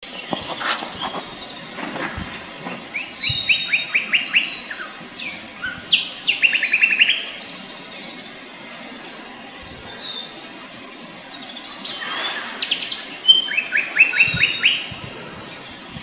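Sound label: Sinaloa Wren song; Cunningham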